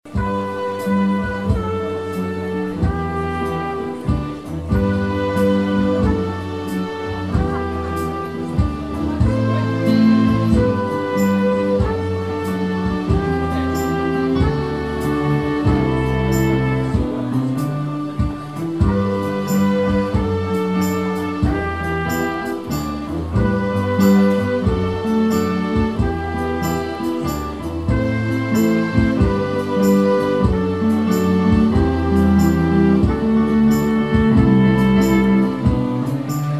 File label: Piano e Cordas